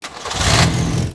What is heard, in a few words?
buick on; Mafie 1